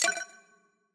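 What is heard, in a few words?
party push to talk click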